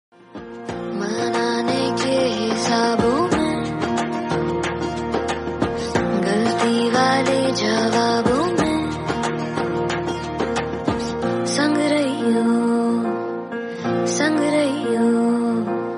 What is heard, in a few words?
Female Ringtone